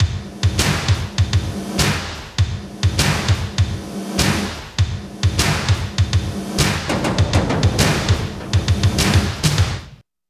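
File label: LOOP, right; East West - Phat & Phunky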